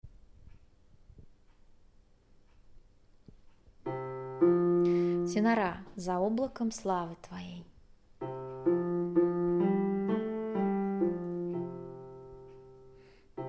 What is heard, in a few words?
tenor; Za oblakom slavy Tvoej